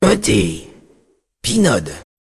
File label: jingle